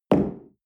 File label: espresso place tablecloth